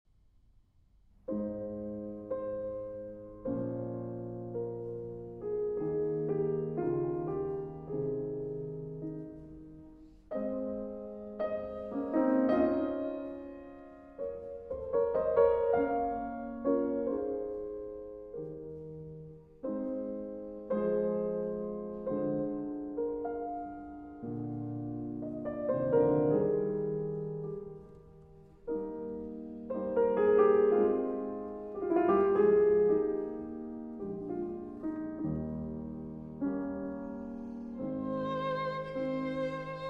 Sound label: Violin Sonata in C Minor, Adagio Cantabile